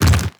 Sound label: twitch, zombie blind, foley; creatures